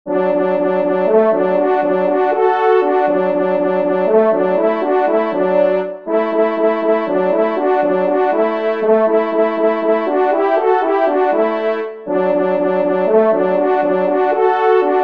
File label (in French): Duo, trompes; Louvart; Fanfares imposables en Concours